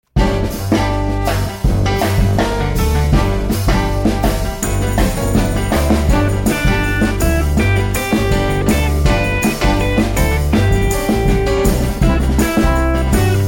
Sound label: Instrumental; Do, Lord, Remember Me